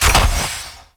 Garage Door Open; Gameplay; Warframe; Venus Open World

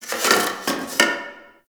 Box Of Trash Rustle

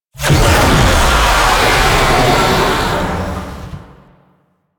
Proto Infested Babau Spawn, Vocals

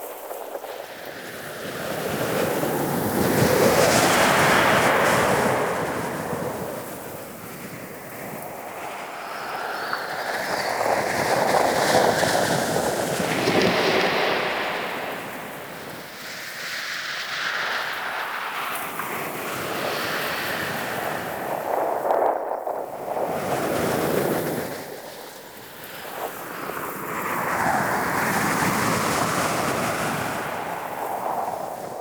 Infested Eris Exterior Wind Rough Loop; Warframe; Ambience; Amb Loops Exterior

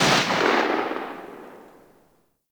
Spark Rifle Shot End; Warframe; Grineer

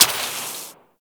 Water Sprayer Mech; Warframe; Weapons

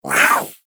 Feral Vocal Attack Short A; Warframe; Catbrow

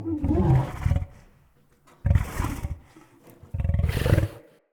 Wolf Idle Trapped Groan; Animals; Vocals

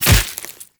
Infested Splitter Gore Spew Burst; Warframe; Grey Strain

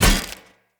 Sphere Polearm Medium Impact Flesh Armour Hollow

